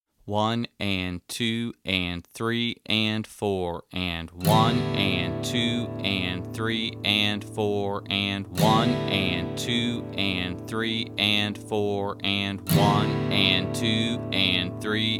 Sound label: Strumming